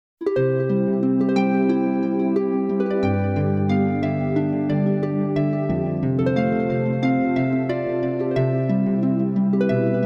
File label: harp's reverberation